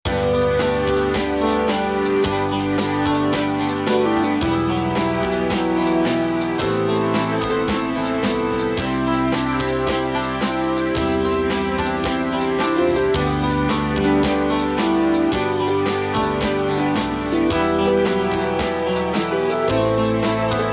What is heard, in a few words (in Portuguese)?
instrumental